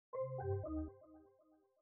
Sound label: Error